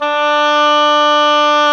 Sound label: OBOE, mf f; Roland L-CDX-03 Disk 1; Oboe 2&3